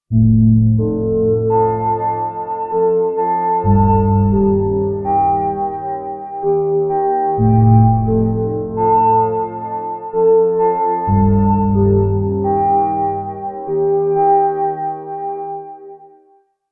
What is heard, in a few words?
Haunted Swing